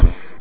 Squelch; Bugs Bannis; Squish 2.6.8

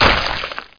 flesh break; Heretic II

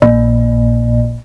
Bong; Cloudz 1.0.3

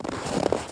ice

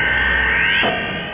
Teleport; workaholic

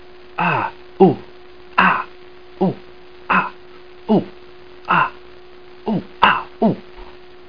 Hair Pull

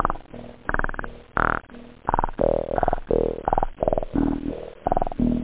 filter; OctaMED SoundStudio V1

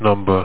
pad; SpaceTaxi

